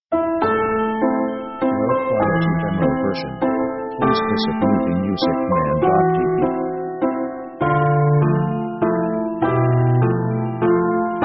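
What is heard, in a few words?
piano french waltz; orchestra themes; piano french walz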